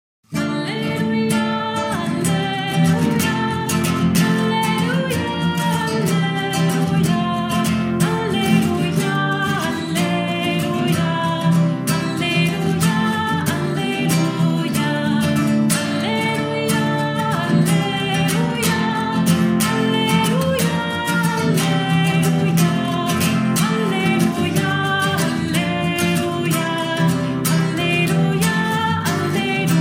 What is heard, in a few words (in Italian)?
Alleluia chitarra; Schutz